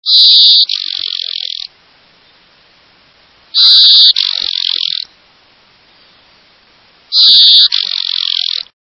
Blue winged Warbler

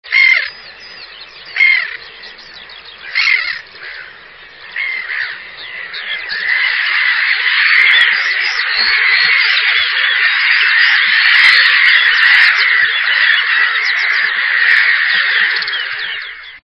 Black headed Gull

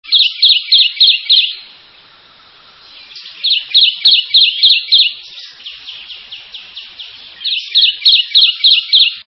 Kentucky Warbler

